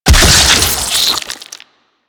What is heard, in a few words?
Cyst Explosion Single; Warframe; Infested Eris; Destructable Cysts